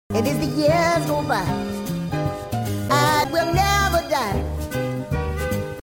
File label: die